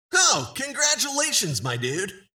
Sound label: congratulations; EL DUDE - Video Game Announcer